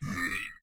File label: Monster Strain